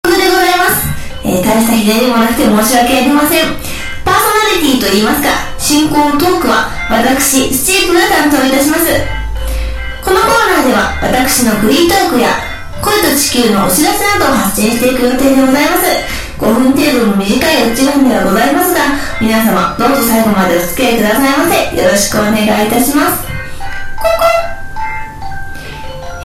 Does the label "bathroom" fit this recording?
Yes